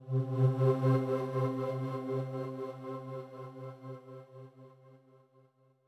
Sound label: basic Mood